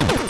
shot

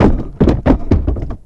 button; vendetta

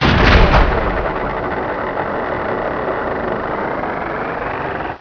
big door

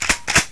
sawed off pump; weapons; vendetta

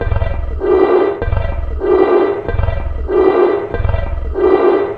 plasma pump, ambience; vendetta

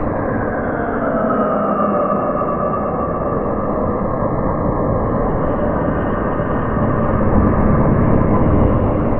machinery; vendetta

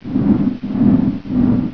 bar fan; vendetta

